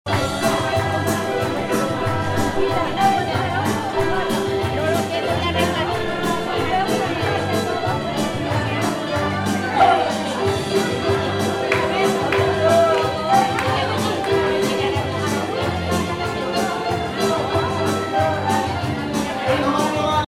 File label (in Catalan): Ambient musical i nadalencs